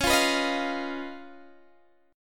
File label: Db 9th Db down Guitar DADGAD; strummed